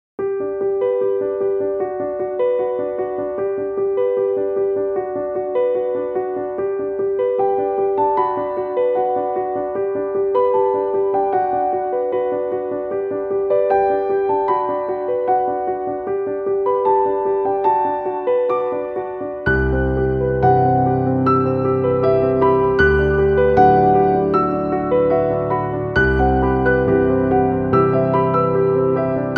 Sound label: Piano Piece